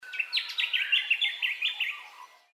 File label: bird lithgow call stereo